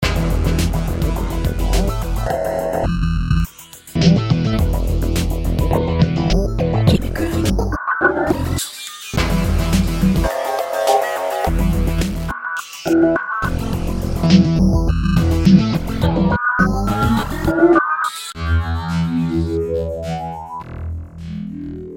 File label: Filter Bank; V-Synth